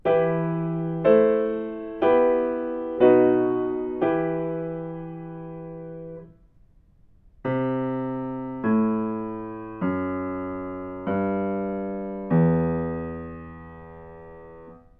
melodic fragments

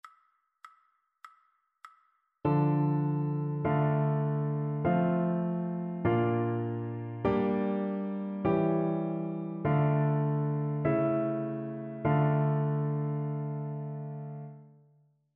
harmonic dictation; ear training

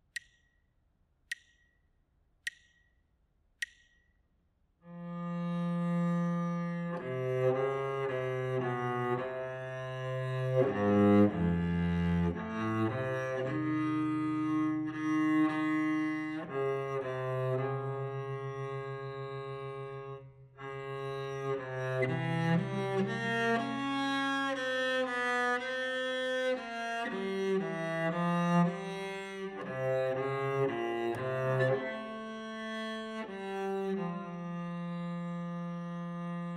melodic dictation

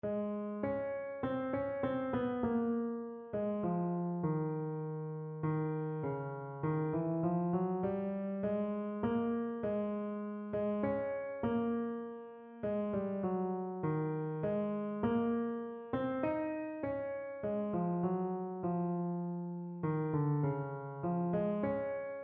melodic error detection

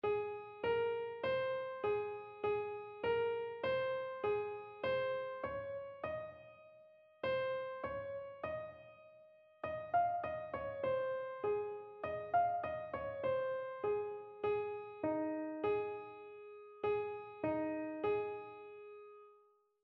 mode identification

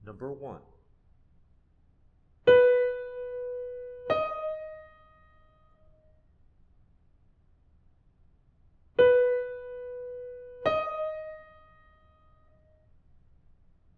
intervals; eartraining

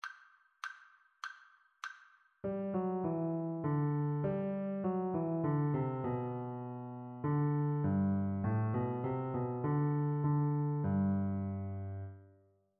partially completed melodic dictation; ear training

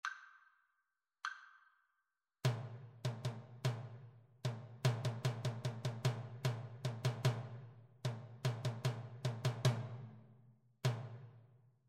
rhythmic dictation

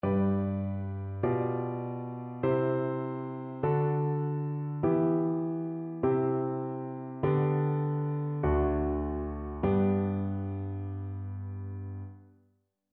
harmonic error detection; eartraining

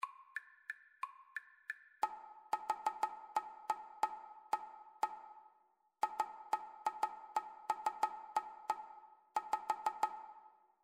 rhythmic dictation; ear training

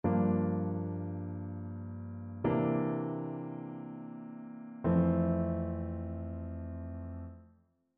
ii V I progressions; ear training

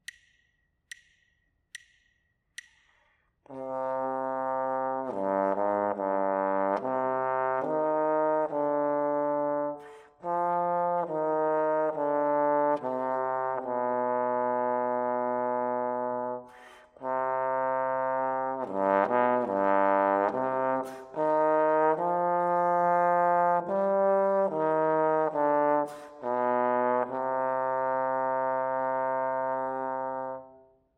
melodic error detection; ear training

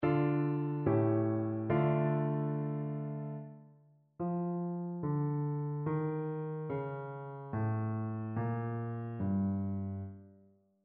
melodic fragments; eartraining

